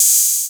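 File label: 808 hi-hat; Wizoo - Platinum 24 Electronic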